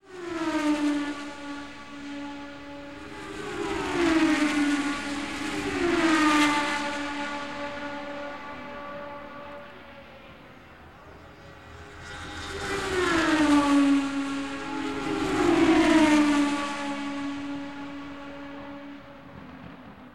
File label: race engine